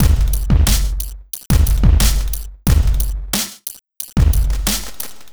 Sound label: Noise Beat; Drums & Drones